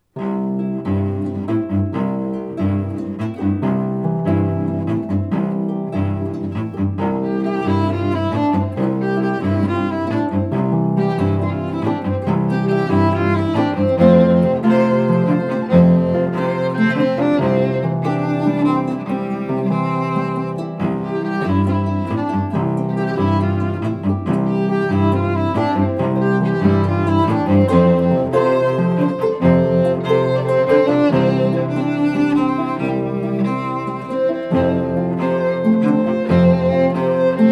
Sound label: with harp